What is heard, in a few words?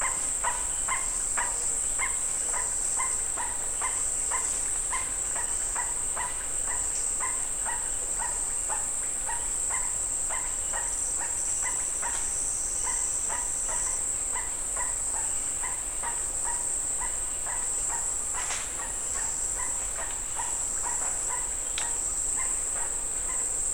Black curassow; پرنده کاکل فری سیاه